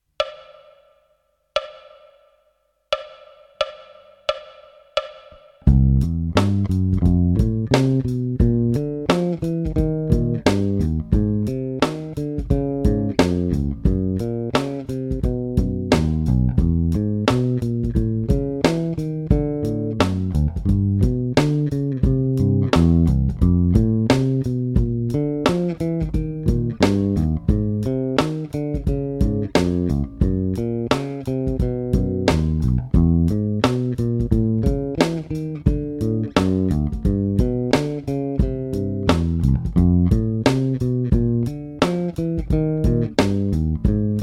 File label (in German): Dur Dreiklaenge Achtel, four strings